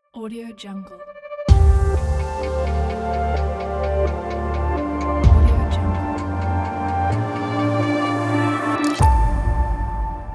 revers elegant logo

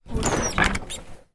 open squeaky cupboard door quickly

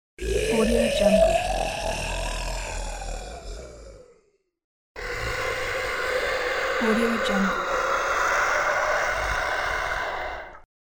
demon growls

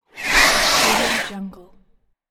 screaming monster

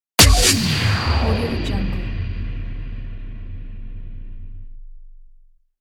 boom

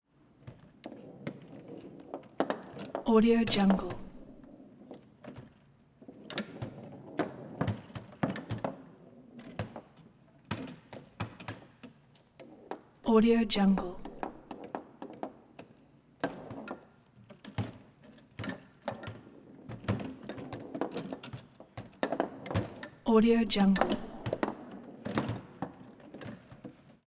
foosball slow going game ball kicks rolls

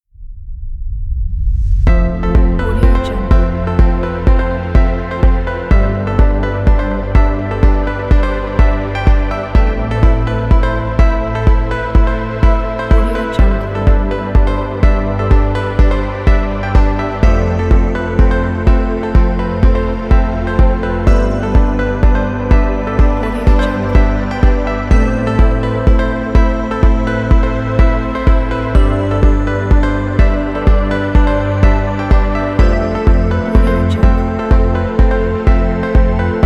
motivational inspiring upbeat ambient music

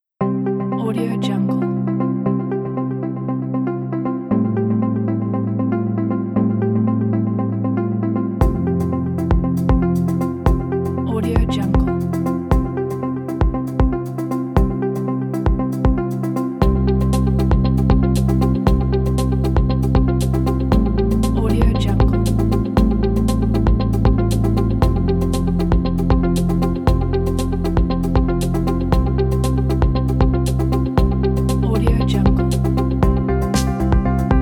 positive energy

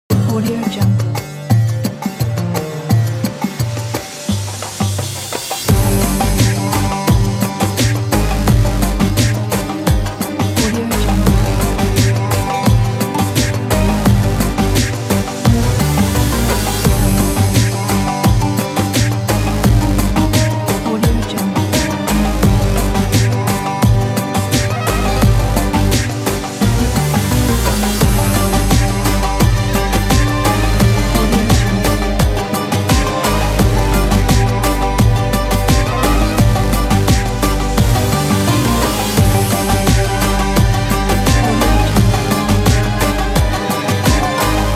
eid al fitr ramadan celebration

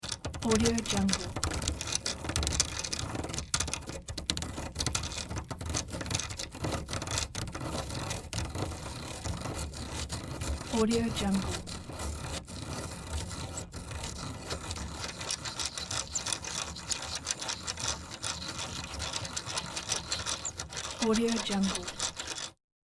old school pencil sharpener

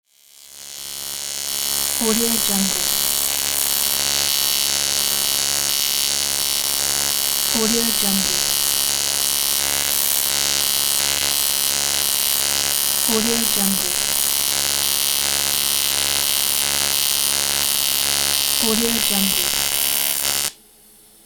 aluminum welding; ideassound

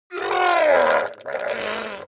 creature fishman shout extreme pain debilitated

